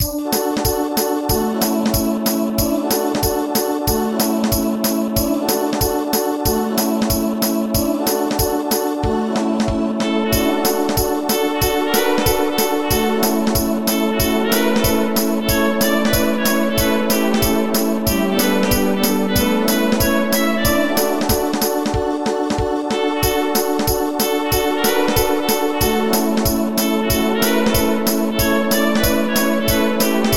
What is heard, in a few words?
Short Trance Remix; Christmas